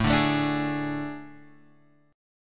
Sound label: A Major 7th Suspended 4th A